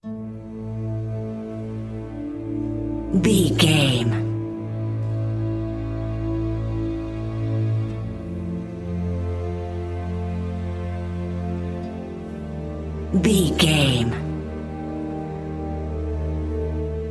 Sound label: hold hope